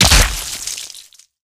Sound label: body head; flinder